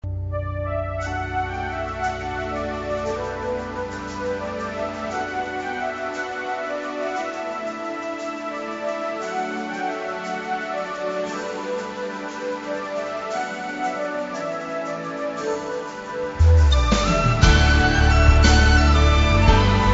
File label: celtic worship